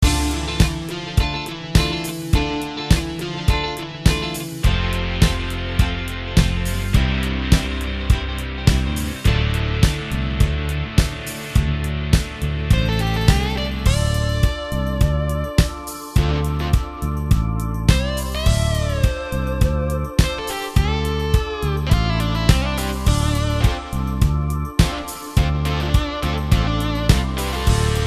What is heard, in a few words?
solo guitar with Insert Efx; DIST-DELAY on Clean Guitar